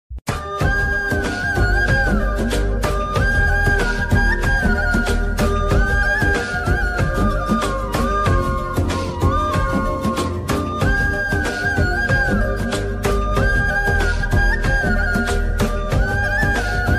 background music ringtone; bheeshma movie

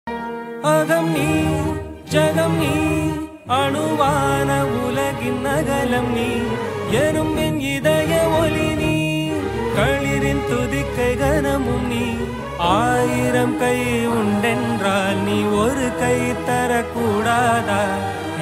mookuthi amman song ringtone

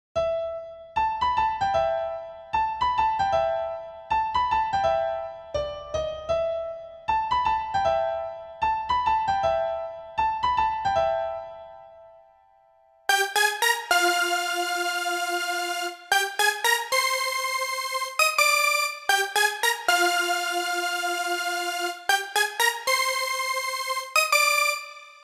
bgm ringtone piano; kalki; transformation ringtone; walkband

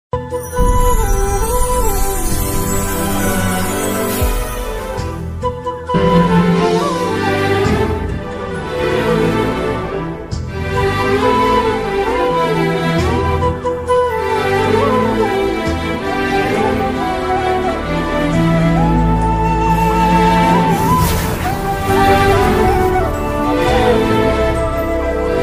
love bgm telugu bgm telugu ringtones; mahesh babu; okkadu movie

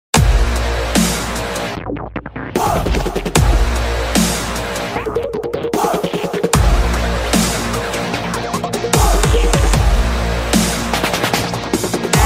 pulimurugan bgm

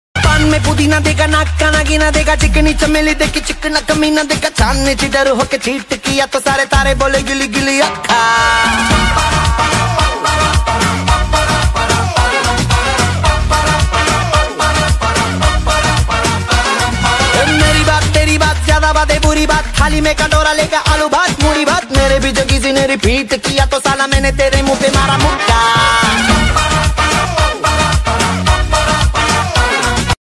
bollywood ringtone music; ananya pandey

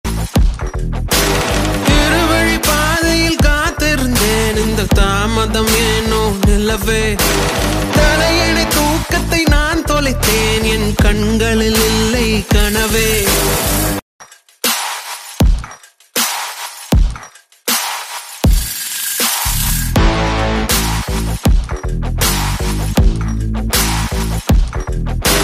star vintage love ringtone, tamil

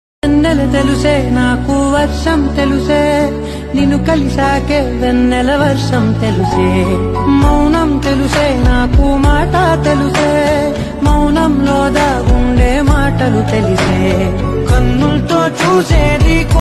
love song; ooru peru bhairavakona telugu movie

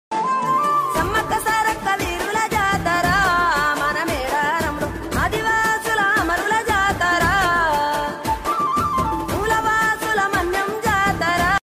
songs bgm devotional; sammakka sarakka; medaram jatara

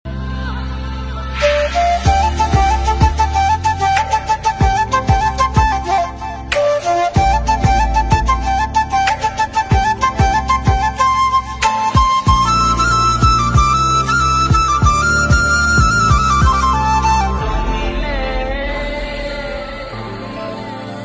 music love ringtone

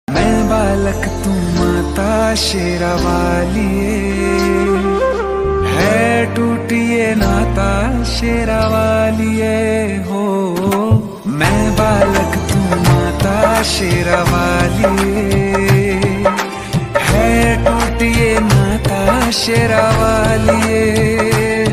hindi love ringtones

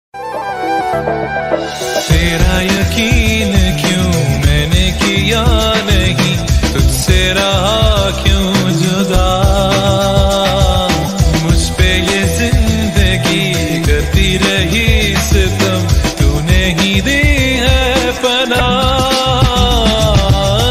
bgm bass ringtone; s k ringtone editz

